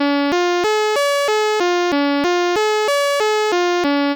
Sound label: b chord v INV; third